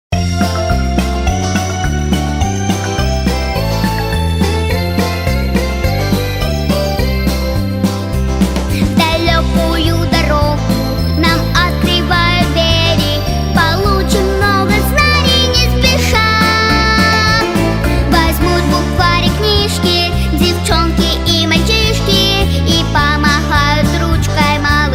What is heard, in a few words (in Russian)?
Песни